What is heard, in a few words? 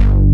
DNC SYN BS, DANCE; Pro-Rec - Synthsonic; Z1 DANCE